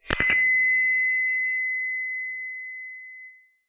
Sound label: Signal Cleaned